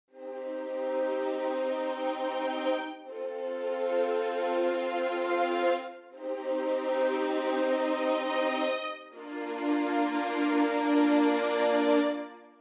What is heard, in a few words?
String Ensemble; Native Instruments Symphony Series